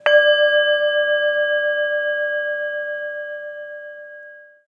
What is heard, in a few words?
sms gong; Mudita Pure